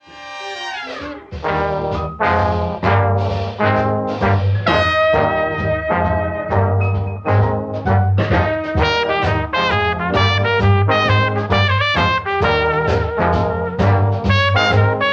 trpt solo only; jazz trumpet

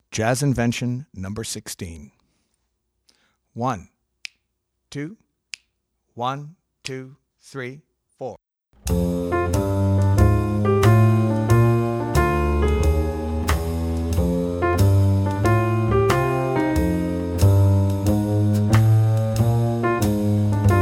Jazz Invention, piano; Jazz Inventions for Keyboard

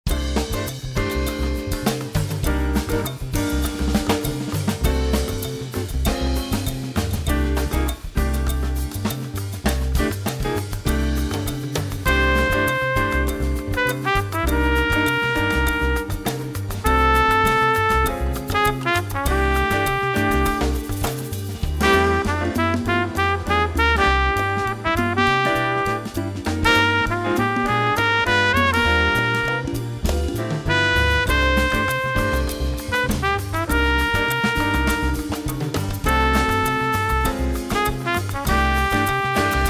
Solo, trumpet

